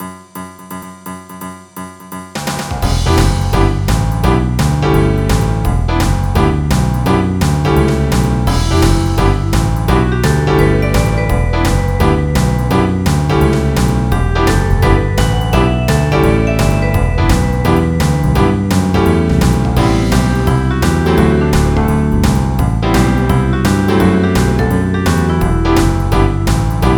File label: BLUESY